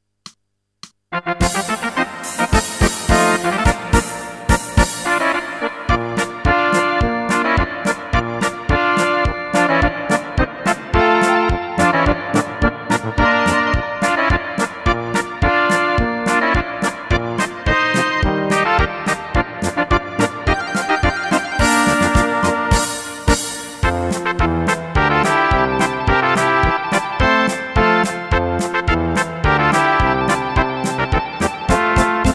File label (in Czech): polka